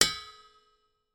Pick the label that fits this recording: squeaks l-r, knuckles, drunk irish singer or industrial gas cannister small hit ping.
industrial gas cannister small hit ping